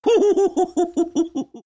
laugh; riki

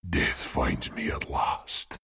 bearform death; lone druid

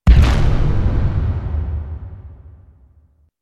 Trap; Templar Assassin

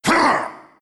pain; leshrac

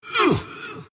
pain; oracle

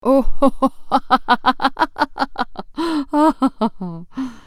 laugh; Aether Wing Kayle

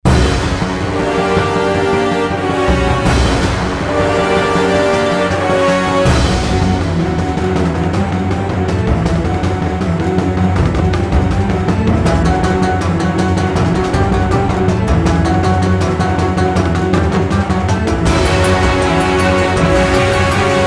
Music; Harmonies of New Bloom; roshan

